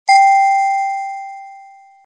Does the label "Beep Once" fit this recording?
Yes